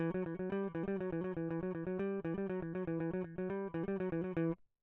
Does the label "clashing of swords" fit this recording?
No